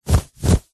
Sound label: clothing jumper fast short movement flap could be swinging punch; zapsplat